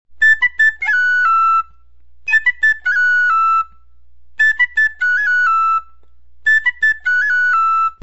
cut and triplet

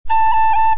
a roll

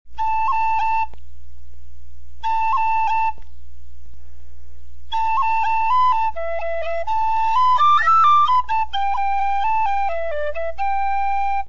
knotted cord rolls

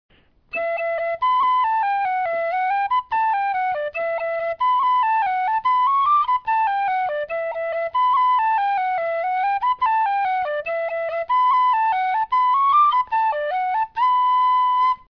morning dew line

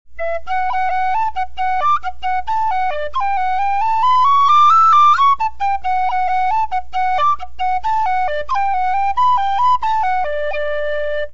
ramb pitch

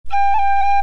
g roll

